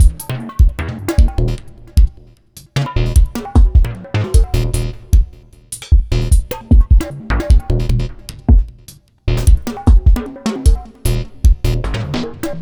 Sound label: DOWNTEMPO BEATS